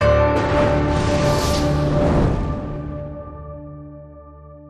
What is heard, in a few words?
free Spin Start; secret of the stones